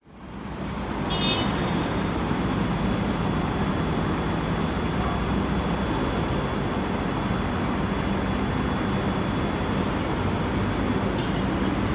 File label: city ambience night rooftop city hum distant traffic insects sirens doha qatar